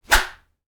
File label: Swift whip smack